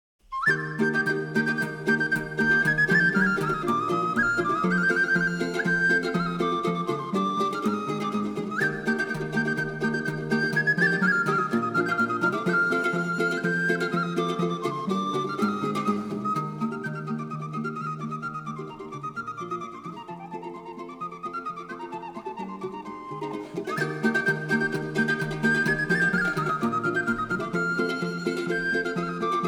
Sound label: Duduki Trio